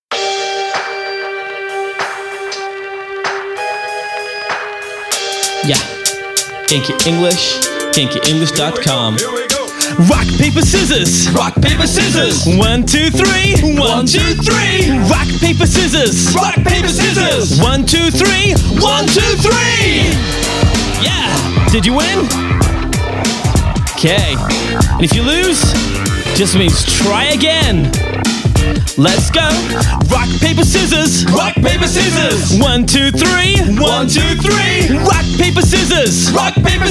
rock paper scissors song

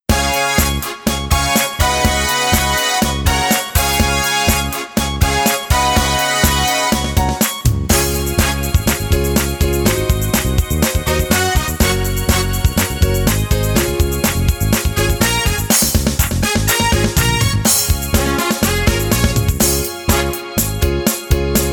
where do you live karaoke

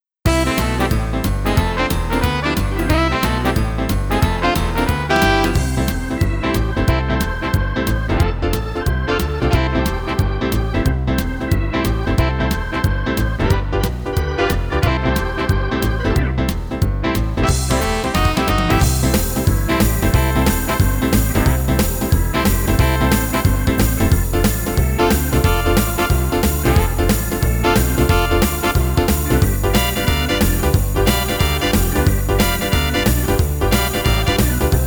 where is baby monkey karaoke

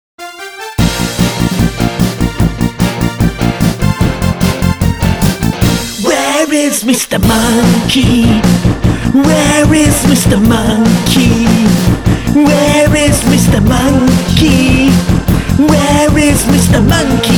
song

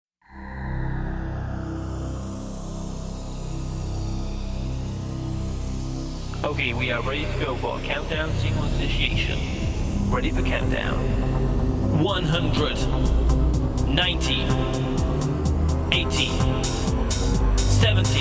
rocket launch 10 - 100 song

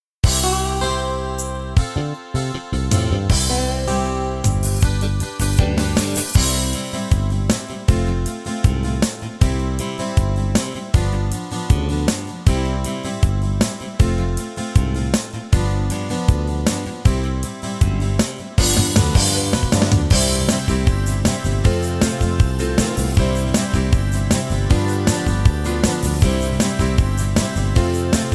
karaoke

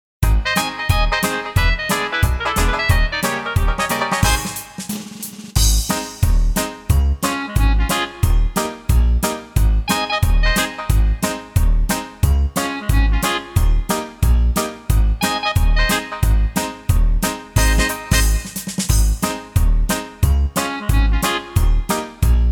baby monkey family karaoke

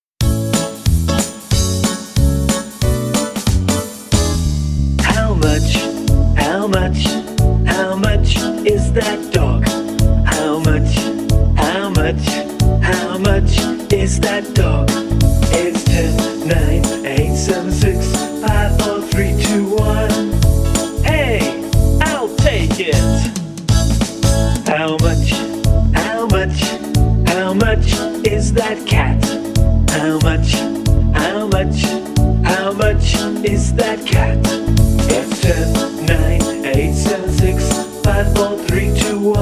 how much song

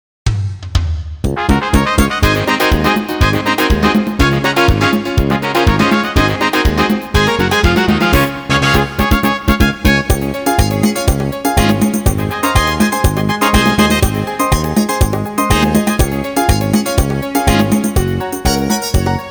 karaoke

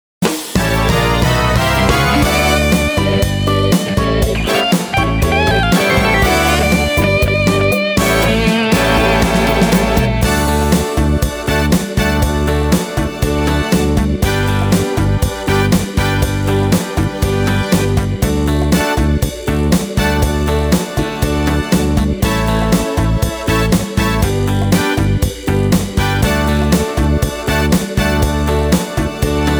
karaoke

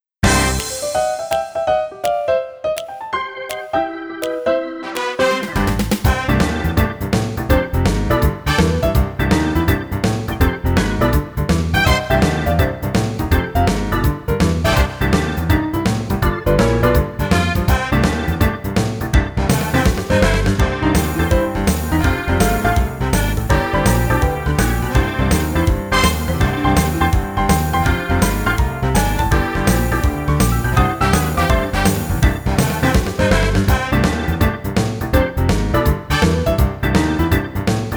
karaoke

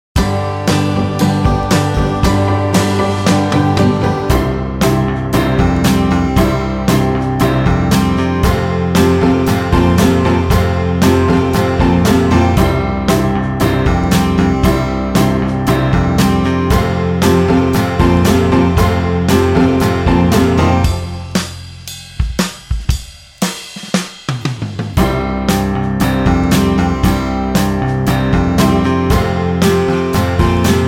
karaoke

